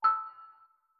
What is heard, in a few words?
new level; exam night 5